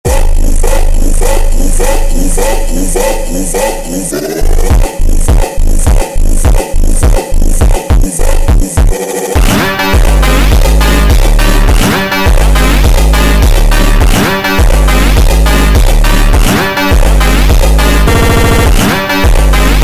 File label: ultra Slowed Reverb, funk